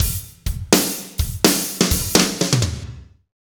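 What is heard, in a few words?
slow shuffle, Roland drum beats; Northstar - Drumscapes Roland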